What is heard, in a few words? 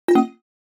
Error notification alert